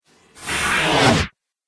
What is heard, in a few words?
Radio Stinger